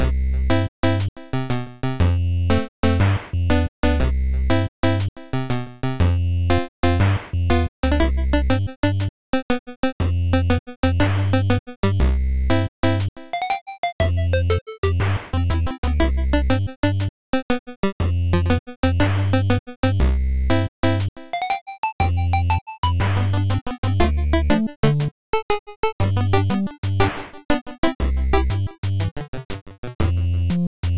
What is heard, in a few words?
Maze bgm; malware windows gamerip 2024